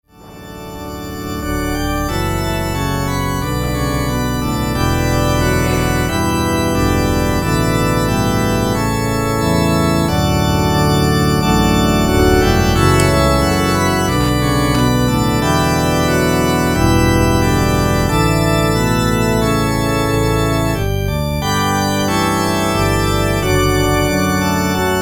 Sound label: organ outro; Organ exit music; St. Mungo's